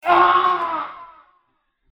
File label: scream